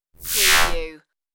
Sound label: alien power charge